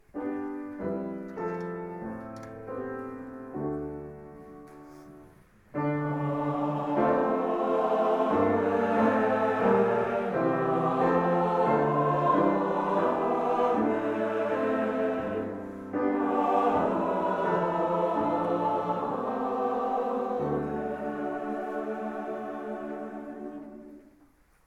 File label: Low Mass - Amen; Colloquium